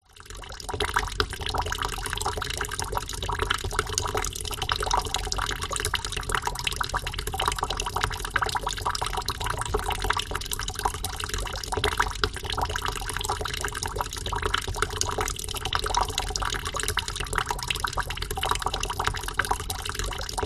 household water drip from tap into empty plastic bucket